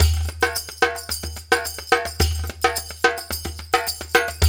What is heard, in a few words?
108 DUM, Dumbek Belly; Spectrasonics; Supreme Beats; African Contemporary